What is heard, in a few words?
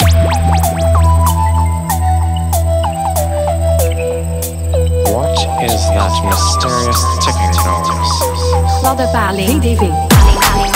potter dub; halloween x harry potter x vettige muziek